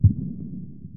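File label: background combat; soundscapes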